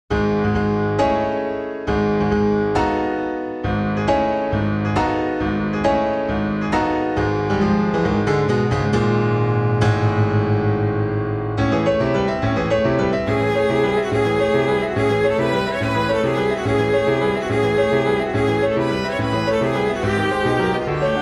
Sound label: Rondo Capriccio for Viola and Piano